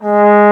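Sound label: Trombone mf G#2; Digital Sound Factory Virtuoso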